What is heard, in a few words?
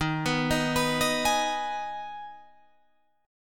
D sharp Suspended 4th Sharp 5th D sharp, Guitar Drop D; chord shape